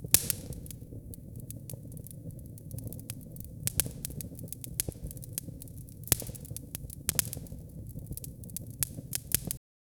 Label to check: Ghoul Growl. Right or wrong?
Wrong